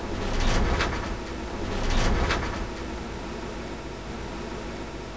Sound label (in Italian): arresto, train; Aln501; OpenBVE